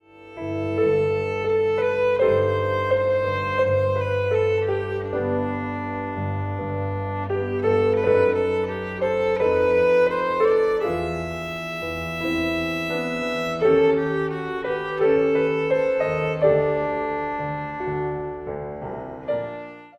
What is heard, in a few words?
VIOLIN; Trinity College London violin exams for 2016-2019